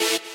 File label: saw